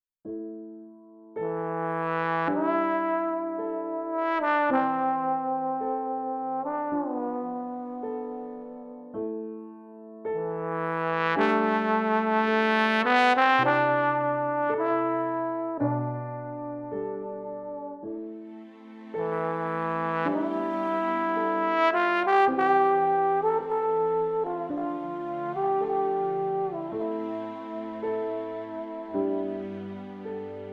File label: Trombone